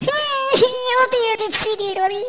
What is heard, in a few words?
GAMEOVER; Macworld (1998-01); Games World; Gubble Demo